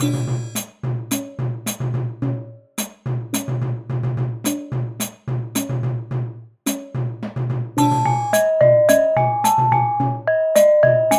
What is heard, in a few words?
Gamelan; Plantant Belle Foret